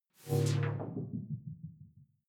sci-fi critical bell filter

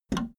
video tape player plastic fast forward release

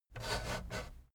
fader plastic scrape single up halfway slow

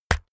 keyboard external key hit push

